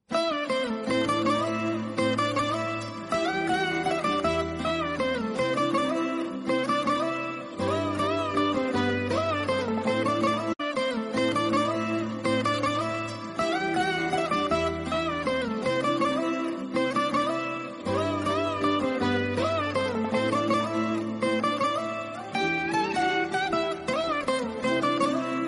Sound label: Bgm